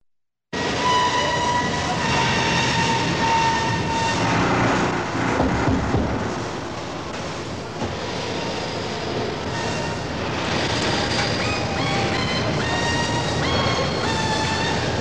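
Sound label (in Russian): завод шум и звуки производства общая атмосфера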